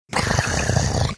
imitating sound bong hit

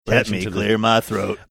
singing

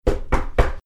making gavel pounding sound

